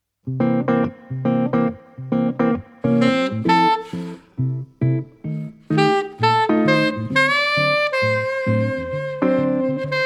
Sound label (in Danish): Saxofon - duo